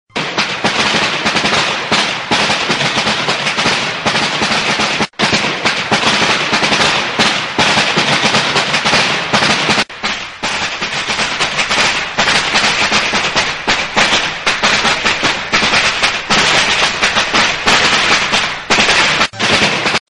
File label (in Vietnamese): tieng phao mung xuan